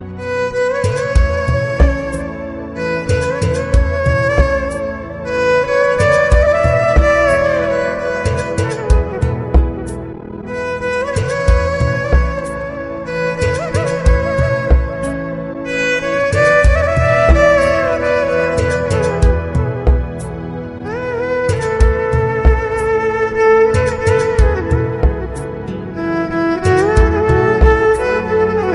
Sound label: sad instrumental music ringtones